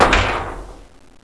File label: bust metal, debris